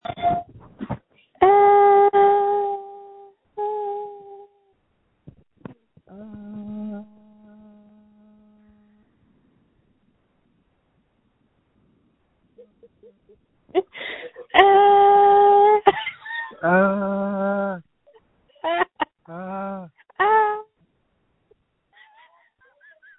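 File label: screams that feature laughter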